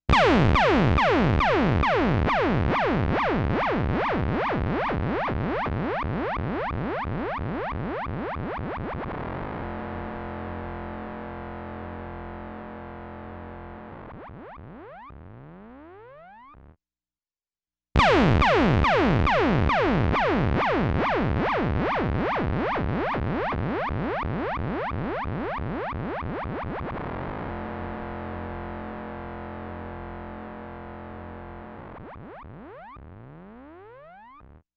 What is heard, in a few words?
LFO SHAPE BLENDER